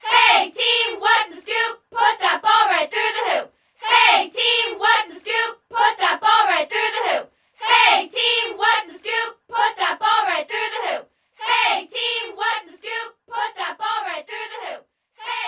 Sfx Basketball

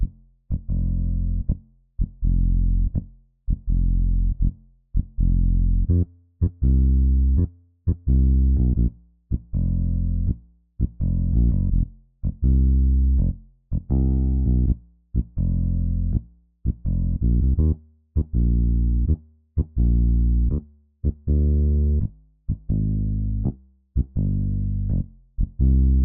Bass; Dogsong

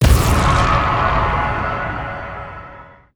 Boss Explode

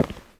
Footstep Rock

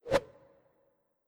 Gnoll Strike

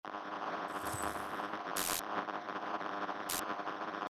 Particle Cannon Loop

